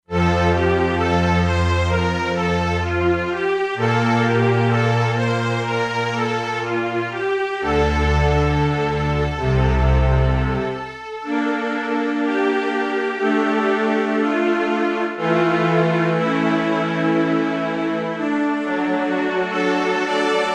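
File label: Legato Strings, E-mu Proteus-2; Factory Presets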